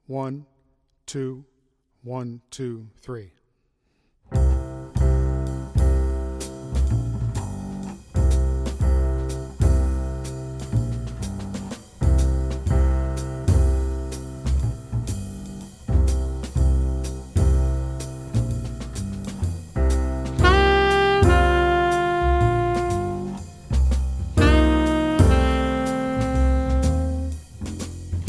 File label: rhythmic audio sample